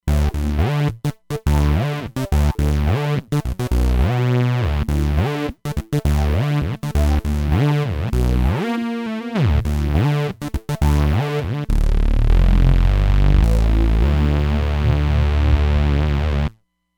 Drop Bass, Roland Fantom XR

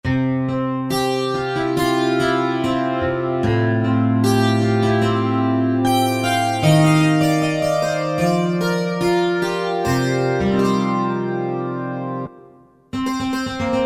Hackbrett, Korg N364; Factory Prog demos

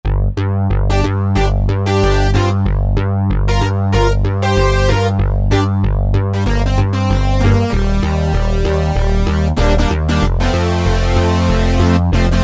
Synth Bass Split

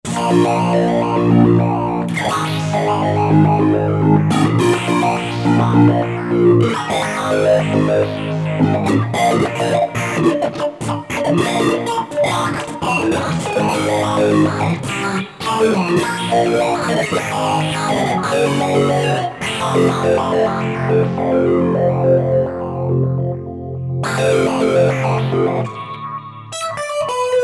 STEP JET

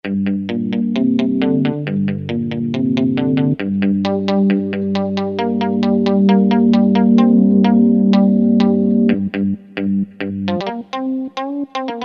Muted Guitar, Kurzweil K2000; GUITARS; Kurzweil K2000 Value Pack; Extra Programs

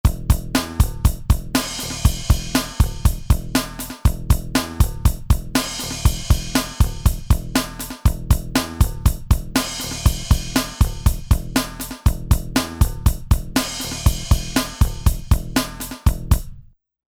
Rock

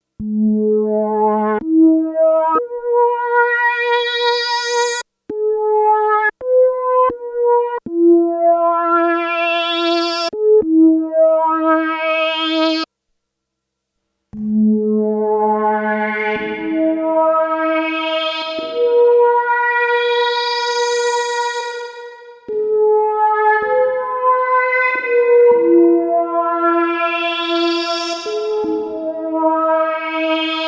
Rev Vocal

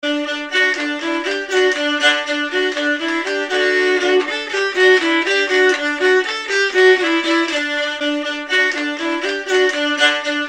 Nordic Keyed Fiddle; Apple GarageBand 2; Apple Loops

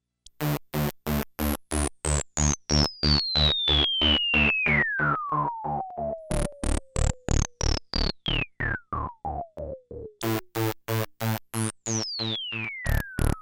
PW Rezz

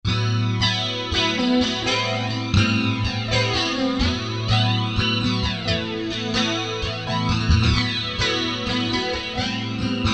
FLOW FLANGE

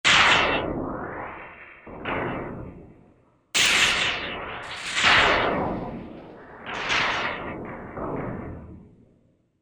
Storm; Korg MSC-14S Sound Effects

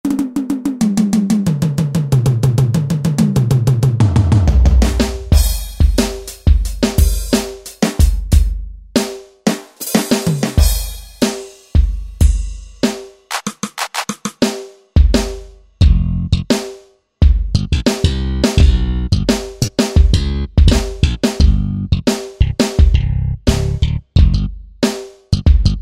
Drums Bass, synth

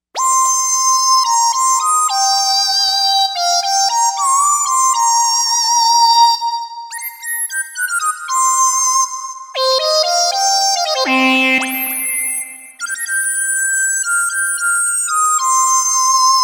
Lead, synth

